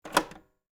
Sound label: Cassette player button click